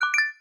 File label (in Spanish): samsung galaxy note 20 notificaciones